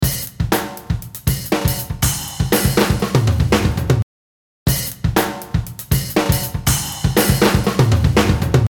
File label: drums